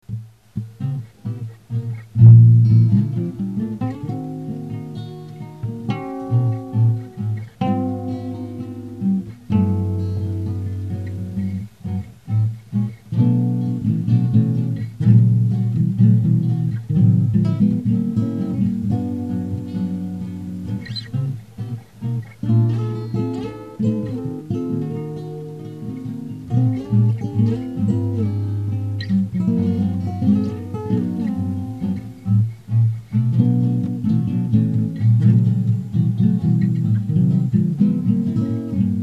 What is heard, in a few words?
Acoustic Legs